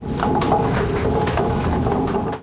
bigpad down

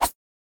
Throw; Boomerang Fu

